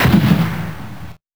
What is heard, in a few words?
Hits & Explode